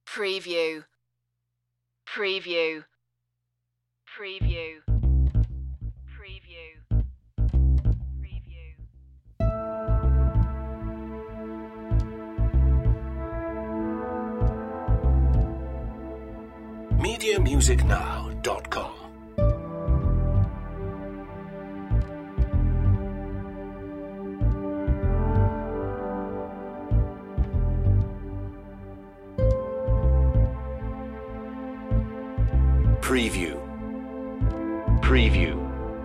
turmoil activate no drums no perc

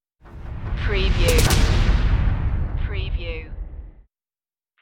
stinger

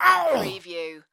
Male Grunt, Human Injury, Pain, Vocal Ugh, Ouch

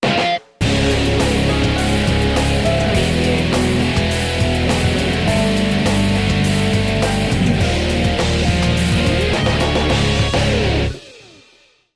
hard fight sting

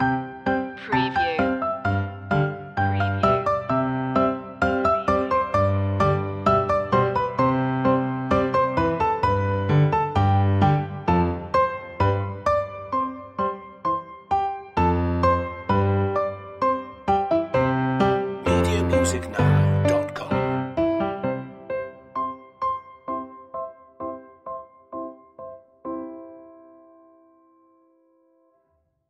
piano loop end; ding dong merrily on high; media music now

